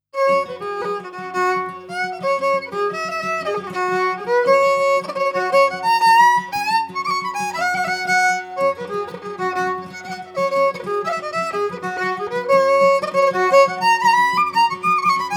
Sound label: jig; Muiris and Unas jig; Kilnaboy Forge jig